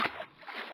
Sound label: water step mono; torque3d